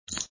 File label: Suck; Lewd SFX